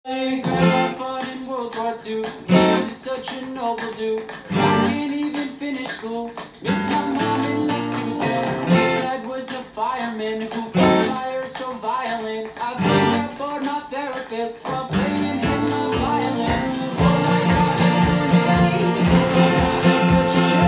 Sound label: guitar; アコースティックギター